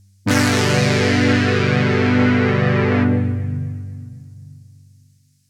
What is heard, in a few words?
Poly Brass Pad; j106